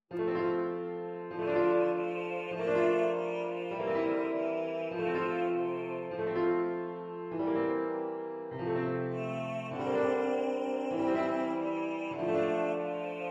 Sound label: Recitative Bass Cantata